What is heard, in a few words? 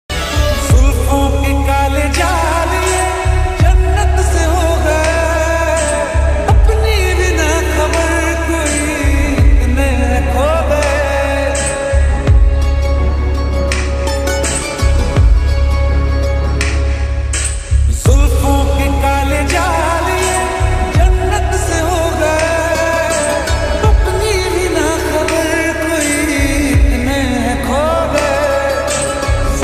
lofi mix